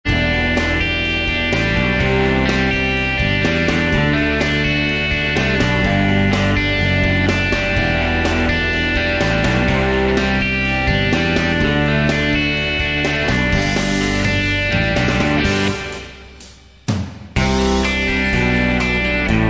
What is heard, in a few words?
Folk Rock